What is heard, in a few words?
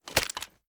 raise first end; reloads; gmod; iw8